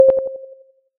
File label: bip; csgo; anti pyjamas